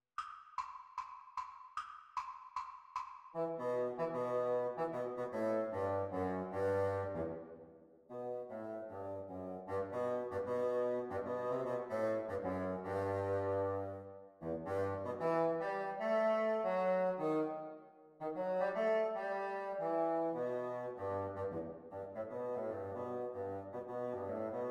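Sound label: Bassoon Duets; Exam Speed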